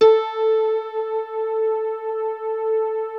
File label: HEAVEN A3, SYNTH PLUCK; Music Store - Claudius Diemeter Schwerlos